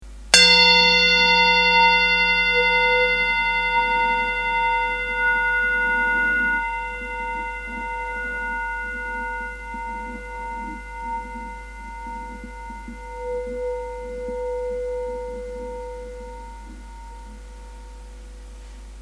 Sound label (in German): klangs, Klangschalen